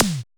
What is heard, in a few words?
Q-TOM; POP-DNC 2